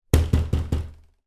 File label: door knocks x4 internal wooden slightly angry